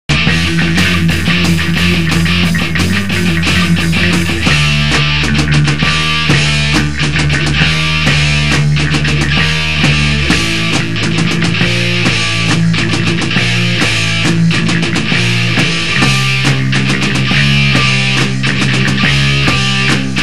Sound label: imperial groove march compare